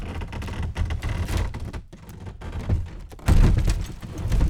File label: Large Wood Beam Creaking; Fairlight Sound Lib